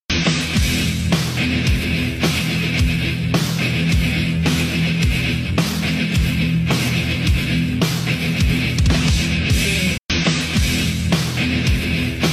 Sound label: guitar